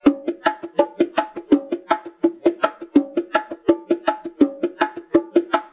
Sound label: Bongo; speed 0.83; tsmtoolbox; phasevocoder identity